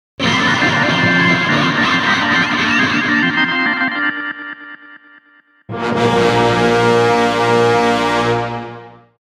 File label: Salmon Run Success Jingle; Splatoon 3; Splatune 3